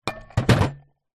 household solid small item set down on metal table